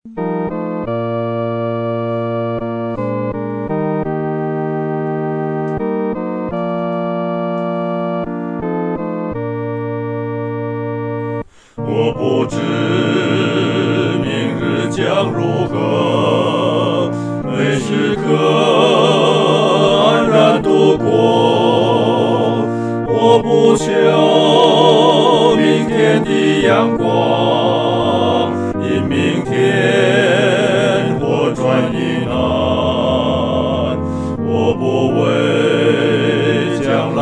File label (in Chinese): 合唱 四声部